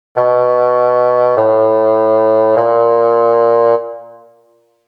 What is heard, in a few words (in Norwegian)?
Fagott